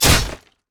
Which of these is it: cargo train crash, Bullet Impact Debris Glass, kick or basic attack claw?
basic attack claw